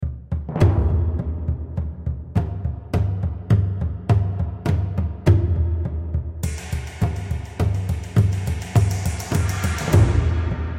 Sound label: combatmusic; stage2; Might and Mayhem